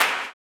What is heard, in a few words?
CLAP, blues & soul; ILIO; Vocal Planet